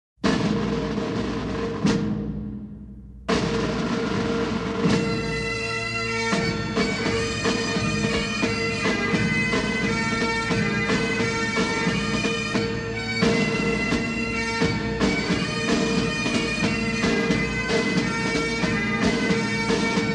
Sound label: Pipe & Drum - Highland Laddie; Music of Scotland